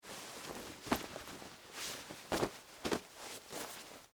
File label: Dress Pants Fold, Foley, Clothes; Dressing (Sound Effects:Human)